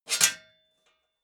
metal sheet scrape fast double; metall

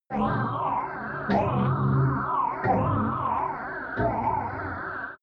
galley shuttle

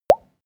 pop bubbles deep; koerpergeraeusche; menschen; soundpool

